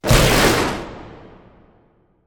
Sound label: Lightning storm; Yu-Gi-Oh! Master Duel